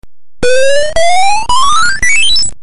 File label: Pacman Atari Death